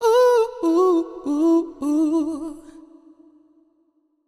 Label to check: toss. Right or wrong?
Wrong